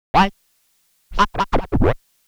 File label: Scratch